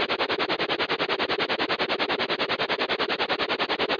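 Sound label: Helicopter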